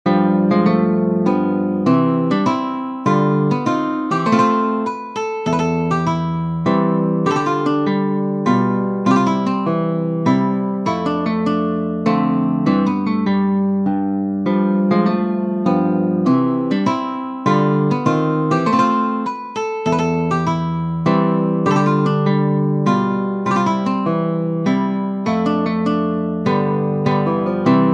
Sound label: Mazurka; francisco tarrega